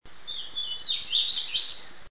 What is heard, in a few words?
hooded, bird sounds; Aves